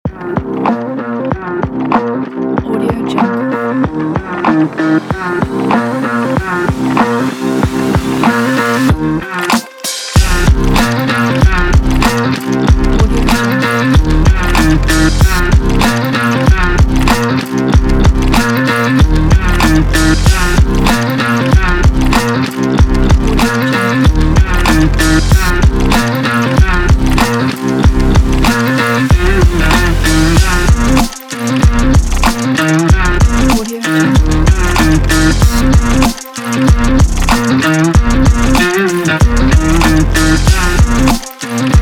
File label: stylish promo beat